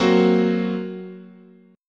F sharp Major Flat 5th, guitar chord

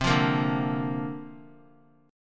C 7th Suspended 2nd Suspended 4th C down; C7sus2sus4 strummed

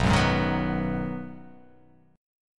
B 6th B down, chord

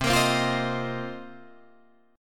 C Minor 11th C Guitar Drop D

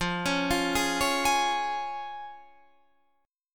F Minor Sharp 5th F down

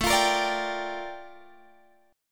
Bb Major 13th A

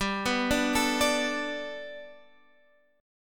G Major Add 9th Guitar Standard, chord; x,10,9,7,10,10; Gadd9 arpeggiated

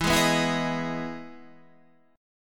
E Major 7th E down; EM7 strummed

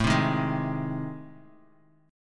A Minor Major 7th Flat 5th A, Guitar; AmM7b5 strummed; 4,3,3,1,x,x; 4F,4A,5C,5G,5C,6E

